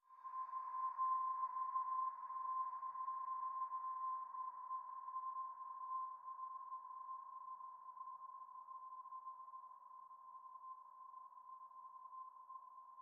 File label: Desert Music Stepping Stones Decays C5